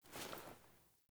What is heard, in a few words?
Foley Action Block Remove; Characters; Player